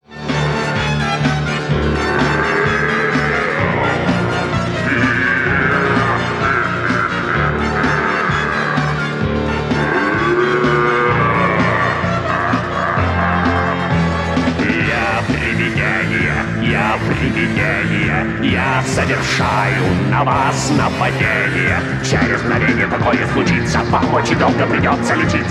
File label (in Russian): ПЕСНЯ ПРИВИДЕНИЙ, ДЕТСКОЕ